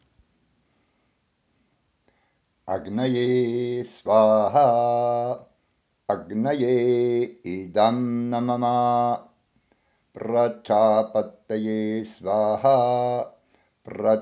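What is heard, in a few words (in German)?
agnihotra mantra sonnenuntergang, Agnaye Swāhā Agnaye Idam Na Mama Prājapataye Swāhā Prājapataye Idam Na Mama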